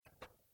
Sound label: shout